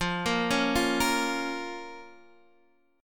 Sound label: F Suspended 4th Sharp 5th F, Guitar Half Step Down